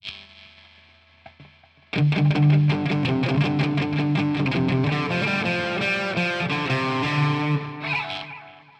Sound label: lead